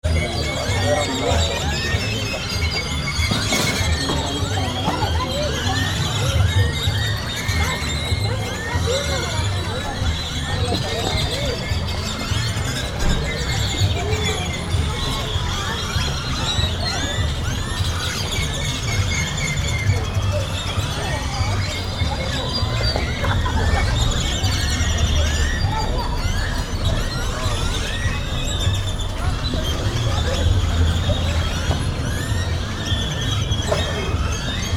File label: Xilitla Plaza